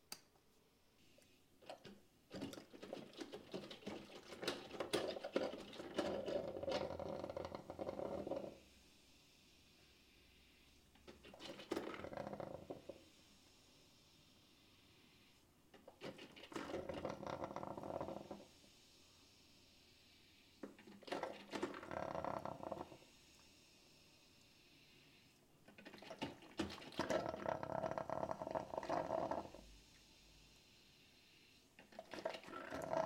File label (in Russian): kofemashyna